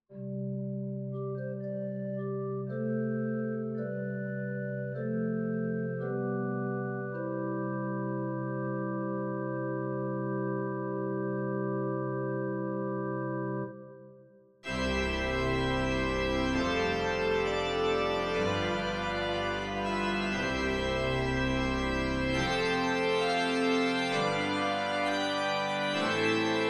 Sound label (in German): Pfeifenorgel; Artis SE